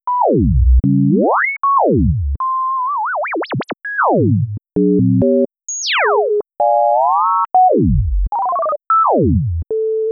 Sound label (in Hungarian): Sinus etud; Vidovszky Laszlo; kompoziciok